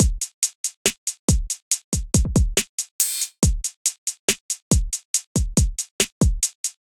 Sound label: Vortex Kit, Trap Drums; MPE Vortex Kit; Trap Drums by Sound Oracle; Ableton; Factory Packs